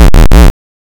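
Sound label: waveform FM square sine silence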